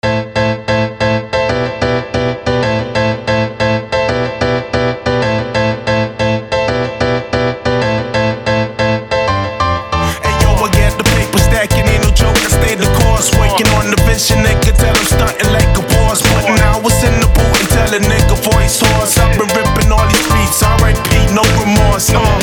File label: Motivational royalty free rap song hip hop background music with vocal; Mantra Studio